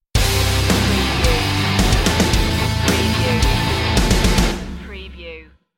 drop the hammer stinger

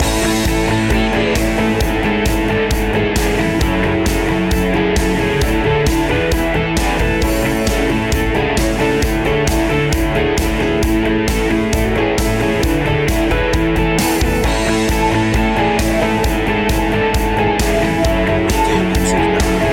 business indie rock loop

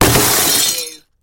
Crash Box Drop Glass

